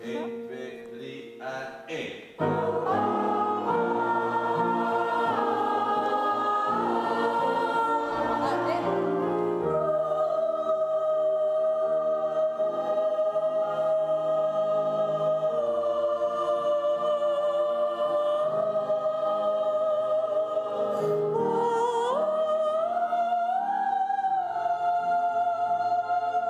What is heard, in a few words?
sopr music